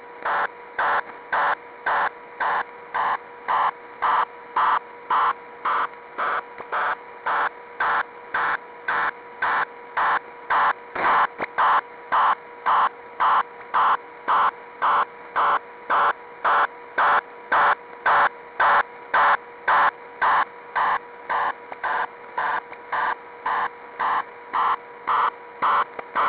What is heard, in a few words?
fsk-1200